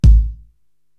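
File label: Bass Drum, Drum Machines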